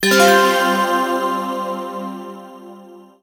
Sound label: door; SE KALEID DOOR 1; prism